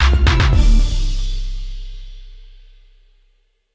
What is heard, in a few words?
music stinger; teenage mutant ninja turtles pizza quest